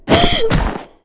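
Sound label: Npc Ship blue harpy damage Fly; Fly For Insanity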